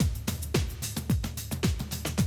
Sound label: Classic DrumLoops, 110